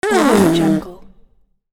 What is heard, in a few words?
fart